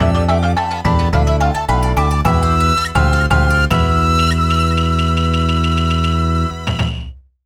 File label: Chapter Complete Fanfare; Ganbare Goemon Toukai Douchuu Ooedo Tengurigaeshi no Maki; Nintendo DS